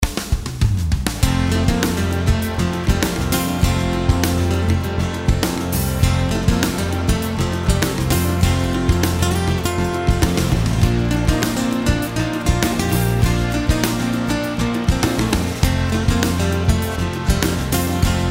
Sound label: Acoustic